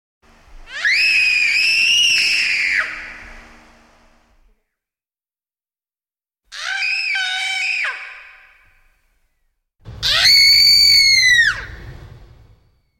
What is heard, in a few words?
Voice Piece For Soprano